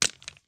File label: stones